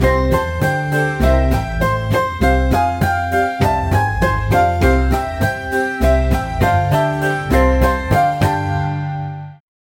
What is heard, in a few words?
music for moments of joy, happy